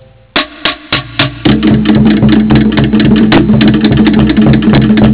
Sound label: drums